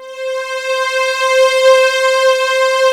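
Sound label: PWM strings C5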